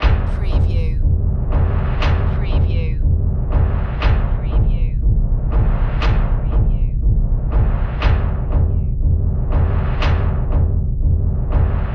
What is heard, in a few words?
Bed Pulses